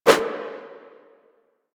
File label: Sticky Bomb Idle Light Whoosh; Grineer; Veilbreaker; Vor